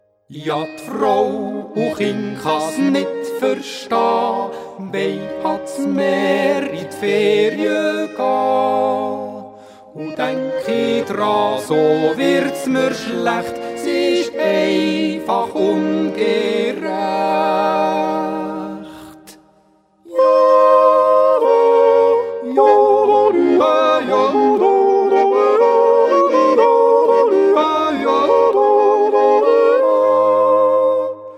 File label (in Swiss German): Ferie (Duett)